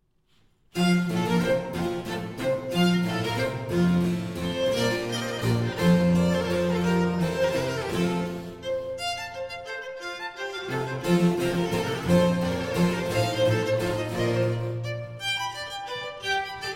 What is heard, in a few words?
Concerto For Musette, 2 Violins and Basso Continuo in G Major, Allegro